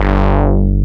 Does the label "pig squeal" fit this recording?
No